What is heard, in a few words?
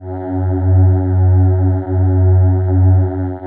ATMO PADS; Best Service; XX Large - Pads